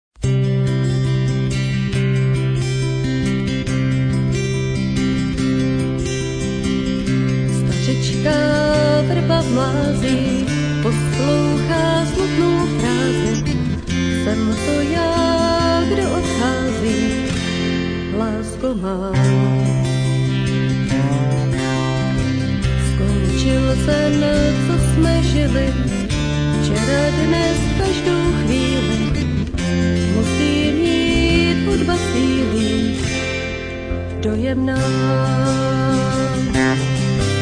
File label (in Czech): smutecni fletna ukazka